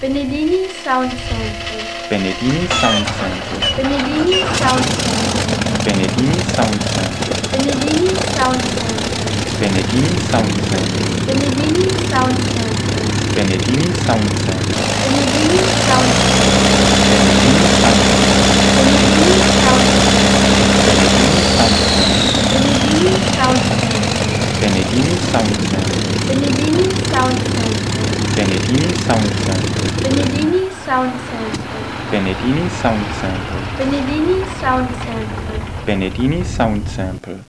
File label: Me109